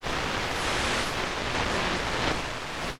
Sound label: simple fuzz; Rumbles